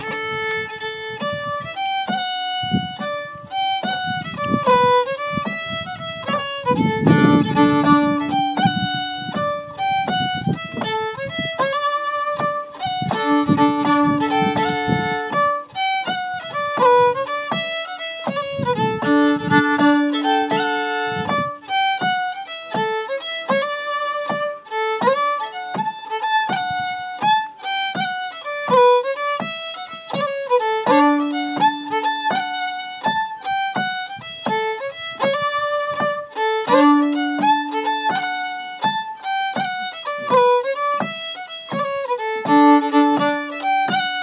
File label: Pipe major; Sam Scott